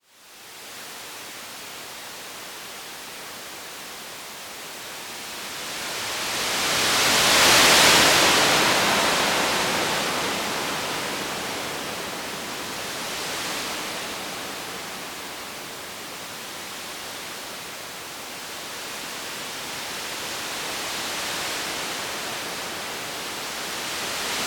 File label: Tides On The Sea